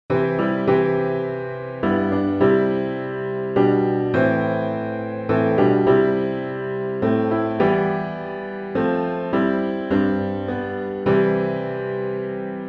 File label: Piano all parts; psalm23 (Rus22)